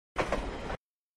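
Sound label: Single Clack From Train, track click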